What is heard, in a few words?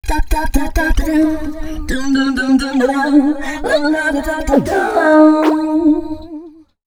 female c#, Dance Ultra Voice FX; Mutekki Media